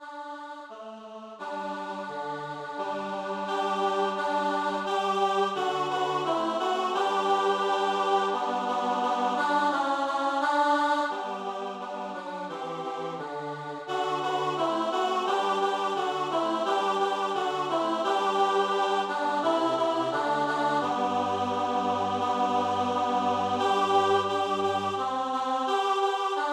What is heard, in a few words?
Psallite unigenito Alto